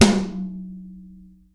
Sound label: Tom Tom